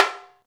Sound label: Tambarime; Club-50 - Foundations Roland; Exotic Drms